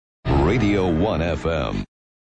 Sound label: radio 1 fm jingle